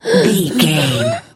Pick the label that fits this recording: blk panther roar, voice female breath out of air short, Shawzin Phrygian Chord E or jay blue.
voice female breath out of air short